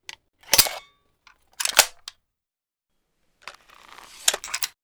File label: reload long, crossbow; weapons; bms